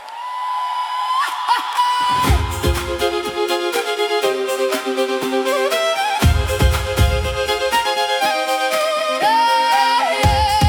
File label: Ukrainian Folk, choir; Ukraine